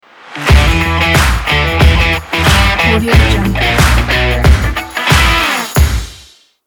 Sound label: sport rock logo